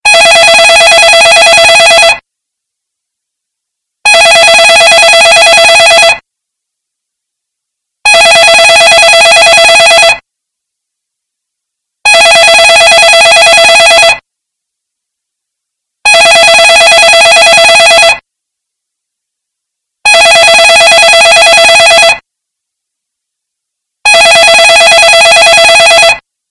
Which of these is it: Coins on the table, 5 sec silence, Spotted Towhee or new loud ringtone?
new loud ringtone